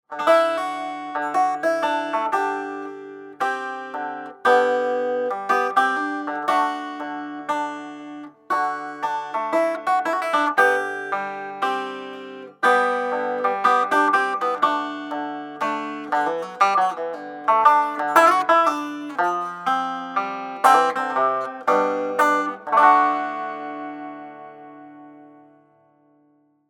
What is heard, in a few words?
reso, guitars